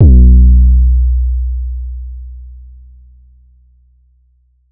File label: 808 one shot B; Slate Digital BASSHOLE Sample Pack